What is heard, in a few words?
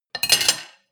cutlery on plate place; soundpool